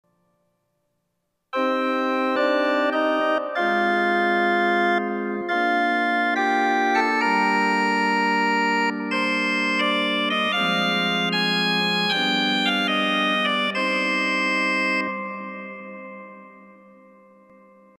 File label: Sesquialtera Gedackt 8, organ